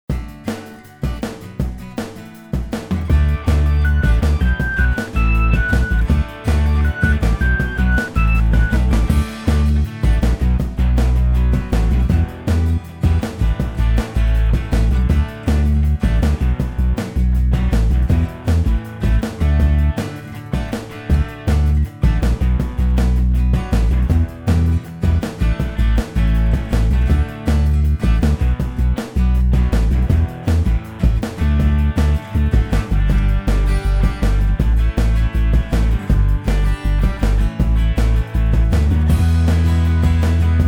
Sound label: karaoke